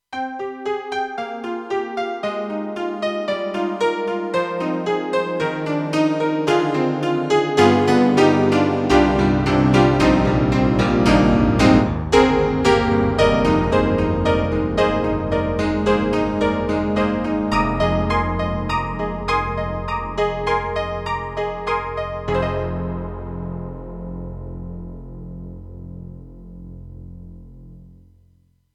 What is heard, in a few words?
Honky-tonk; Roland JV-2080; GM